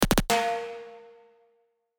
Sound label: Fill In